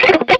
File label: camera shutter; jam; stereo